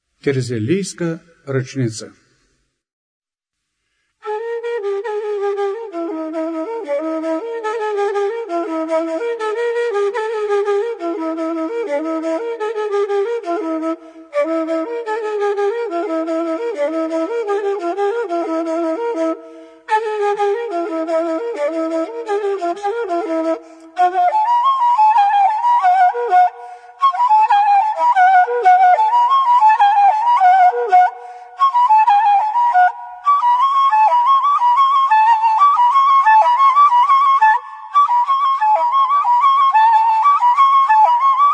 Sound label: kaval